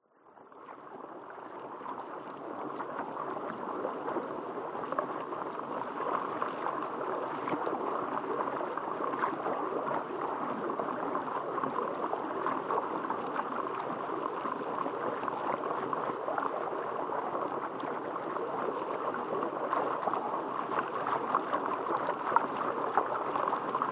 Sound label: underwater flow pumping water fountain